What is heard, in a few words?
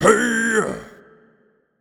blues king attack; Crypt of the NecroDancer